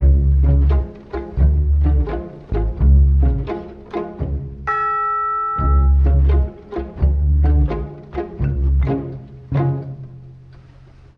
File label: strings, Loops; Phonky